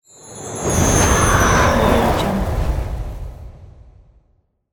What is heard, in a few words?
magical spell sound